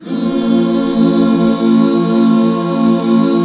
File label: BB MI, Back Chords; Sound & Vision - Gigapack II CD 1